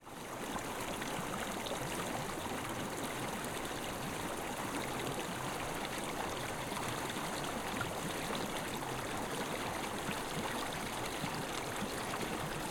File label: Stream - LIGHT - Medium Speed - Flow - Left-Heavy Trickles, Winter Forest Stream; Pole Position